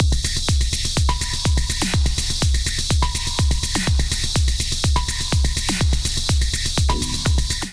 Sad Drama Kit 124 bpm; MIDI Clips; Ableton; Punch and Tilt

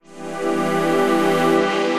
Synth Pad; Tidal Pool; Ableton; Beat Tools

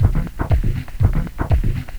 120 Dance E Perc; Dance DJ; Percussion

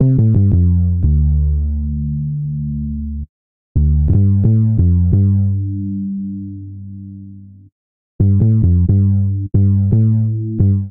OC Bass C Major 88bpm, Bassline; Tonal; MIDI Clips; Drive and Glow; Ableton; Factory Packs

